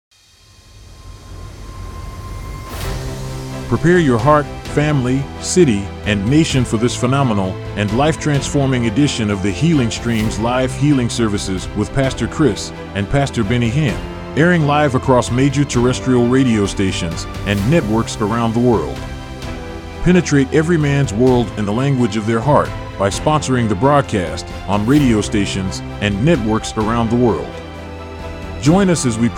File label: JINGLE